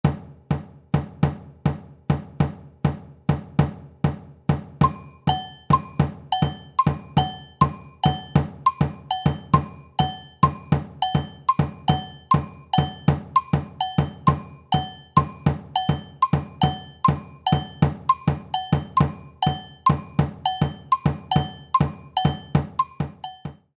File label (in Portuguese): batucada agogos